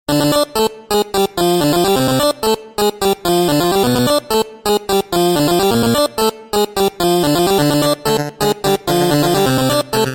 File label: 8-bit